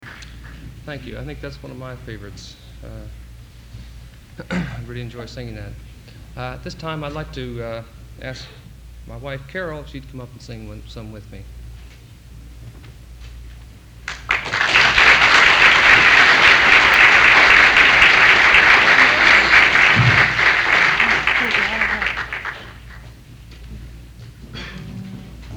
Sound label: Applause